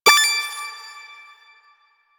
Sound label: Snake Game Eat; Warframe; Nineteeen Ninety Nine